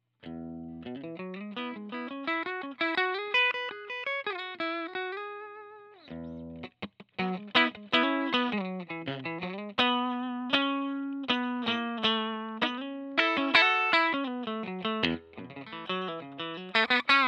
Bridge Clean

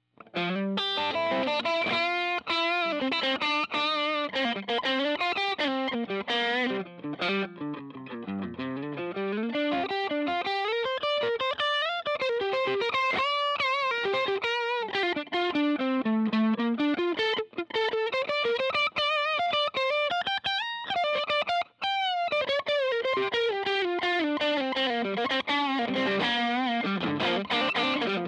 Middle Overdrive, guitar

